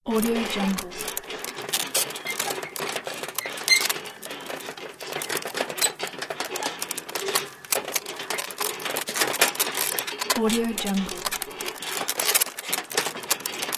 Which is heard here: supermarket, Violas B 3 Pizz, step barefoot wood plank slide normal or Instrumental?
supermarket